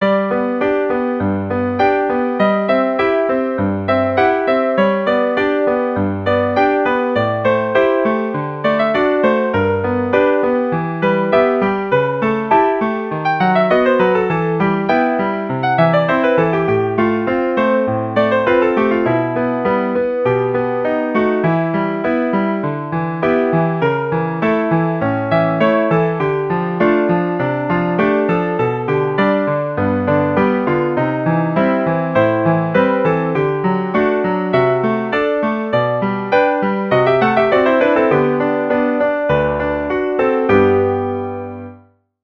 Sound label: style mozart